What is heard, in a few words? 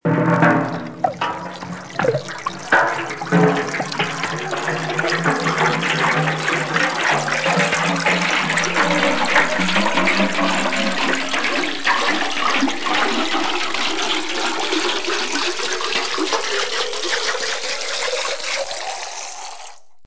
shredding field gas; zps